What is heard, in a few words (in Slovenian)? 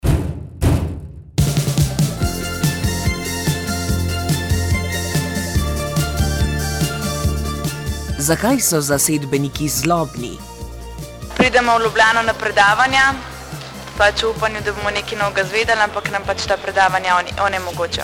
JINGLE zlobni; detabuizator; nocni msu